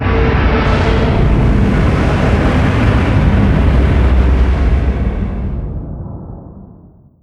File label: distance ships destroyer death; Hearts of Iron IV Death or Dishonor